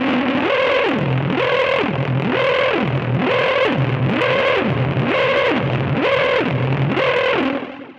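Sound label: alarm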